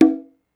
Congo, Percs

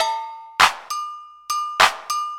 Loop

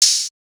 Open Hats; OH; AFSK; SouthernSavage

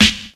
Snares

